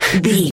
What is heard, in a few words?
voice male breath fast single